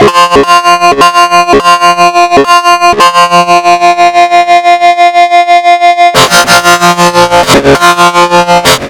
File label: DISTLOOP, Big Beat Samples